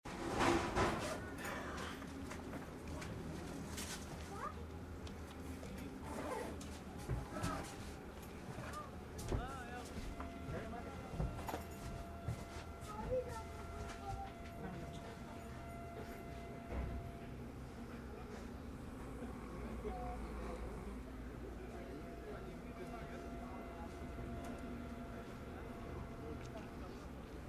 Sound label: TRAIN DELHI HARDVAR